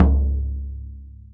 Arabic percussion; ETHNIC and WORLD PERCUSSION; Arabic Percussion Kit 1

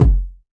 floppy bounce kick

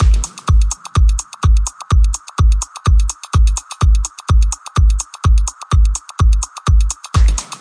126 bpm solid kicks tweaky tail

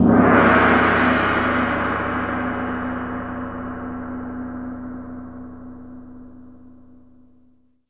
muted gong; Gongs and Super Crashes; single hits

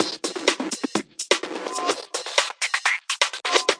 126 bpm extreme noise looper

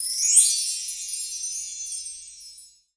PERC WIND; Electro Soul Kit; STYLE KITS (Hip Hop, Etc)

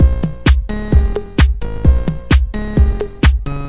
130 bpm ping pong minimal, drum loop

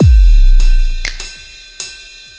100 bpm deep single kick hats; 091 to 100 bpm

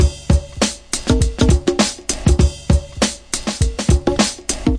100 bpm hard conga vinyl; 091 to 100 bpm

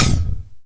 flange down sd, Snares; single hits

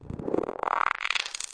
climbing filter up thingy; Electro and Synthetic

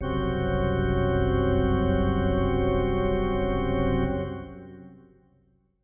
Heavy Pipes C, heavy pipe organ; SYNTH KEYS

